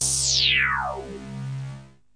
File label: oldschool bandsweep; Weird and Interesting Hits; single hits